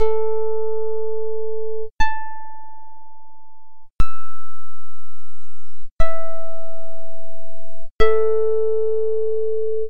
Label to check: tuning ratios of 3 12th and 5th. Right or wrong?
Right